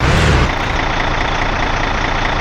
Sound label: rev, truck; vehicles; garrysmod